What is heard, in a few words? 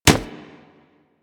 Melee Fist Impact Generic